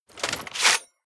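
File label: dagger one hand draw, equip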